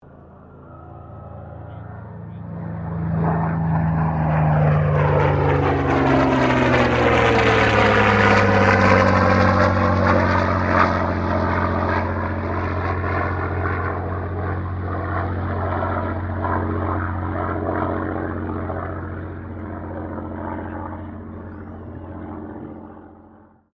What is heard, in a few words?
AIRCRAFT SUPERMARINE SPITFIRE MK-XVI PASS